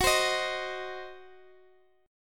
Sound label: C Diminished F sharp, chord